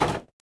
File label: plane; mcheli